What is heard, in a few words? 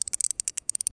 very satisfying click; money